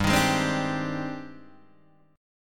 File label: Ab Major Sharp 11th G